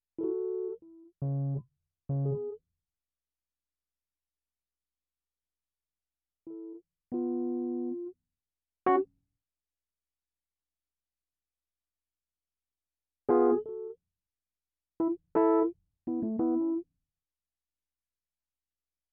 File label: Keyz R